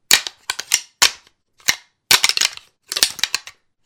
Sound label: Deer Rackling